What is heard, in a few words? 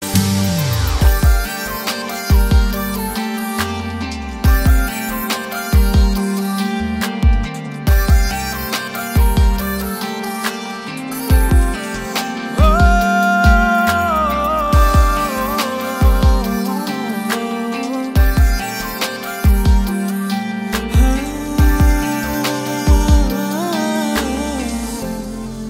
Humming Tone